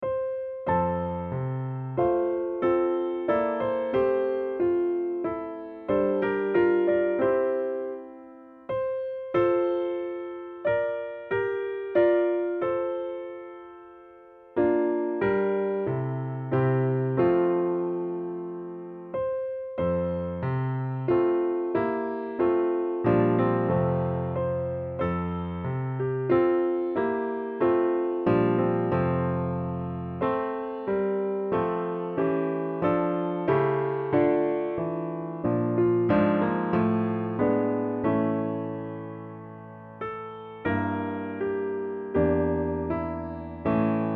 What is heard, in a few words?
Piano Acc F HIGH